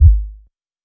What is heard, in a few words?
Sub Impact